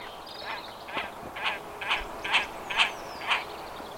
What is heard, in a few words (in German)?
haubentaucher